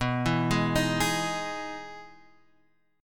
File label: E Major 7th B, chord